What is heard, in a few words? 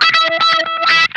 OVER WAH, Funk Guitar; 90 s Sample CDs; Zero G